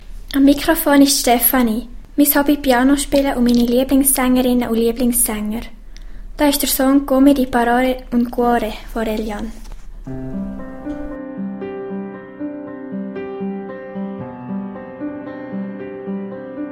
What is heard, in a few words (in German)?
Piano spielen